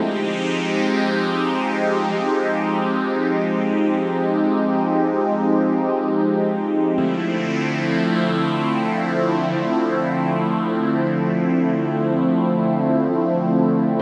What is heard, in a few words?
Pad, Club Techno, 137 BPM; Zero-G; Construction Kits